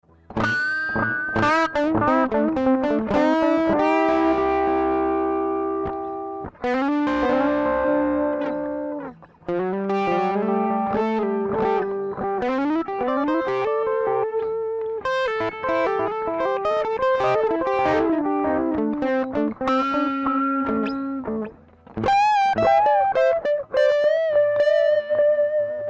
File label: v-delay